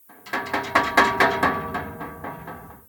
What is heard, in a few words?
Metal Bars Random; Devour; The Town